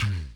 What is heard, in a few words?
kick, Millenium MPS-400